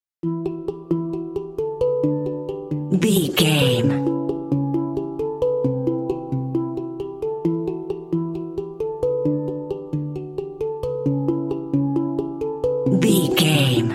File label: african mallets and piano